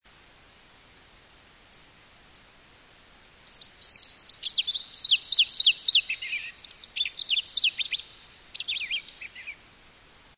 Oven Song

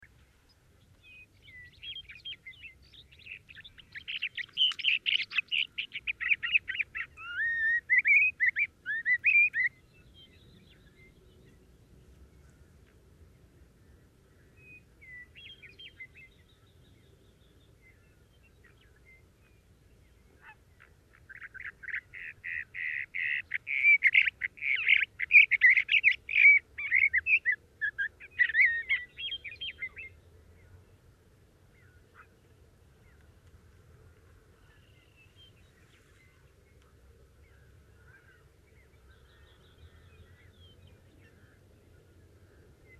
Thrasher Sage songs, Birds

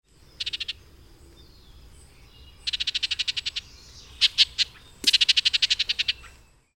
Oriole Baltimore Chatter

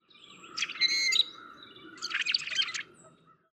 Blackbird Rusty Two Songs

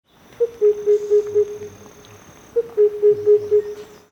Cuckoo Oriental

